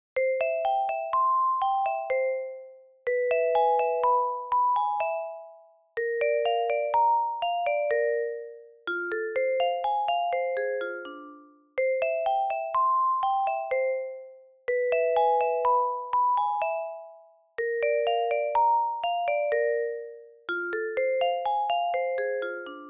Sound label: vibraphone